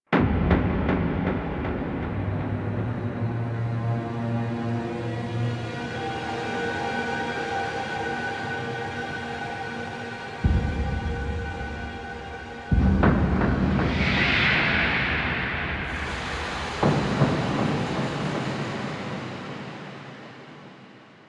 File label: Amb Cue, Music; Warframe; DE; Hubs; Syndicate Red Veil; Tenno